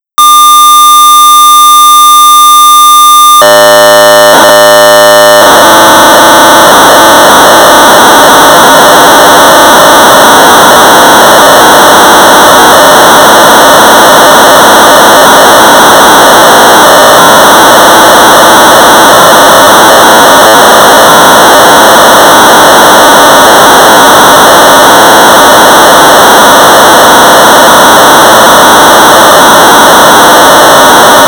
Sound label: Sorcerer 1200 baud; Shape Maker; Don Ursem; Quality Software; BASIC